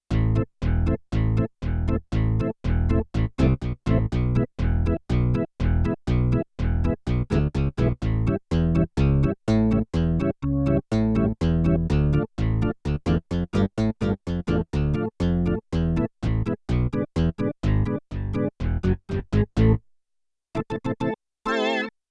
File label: Bass-Organ-Split, Yamaha TX16W; Combo Performance 2